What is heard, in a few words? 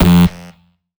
Bass F, Bass Synth; Instrument Oneshots; 99Sounds; Monosounds